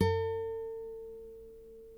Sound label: NYLON NO VIB, Guitars; Spectrasonics; Hans Zimmer Guitars VOL-1